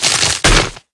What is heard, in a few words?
Bird Crash; CaptainIce